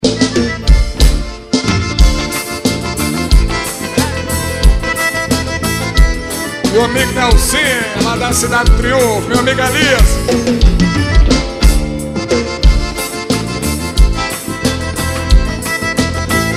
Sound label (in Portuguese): aboiador